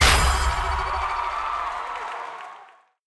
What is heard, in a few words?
bonus game Freespin won; netent